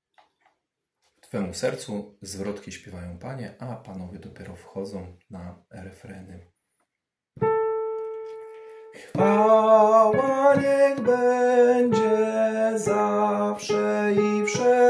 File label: Tenor; nagrania glosow